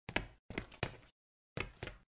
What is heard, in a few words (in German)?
laufen; The Dream Machine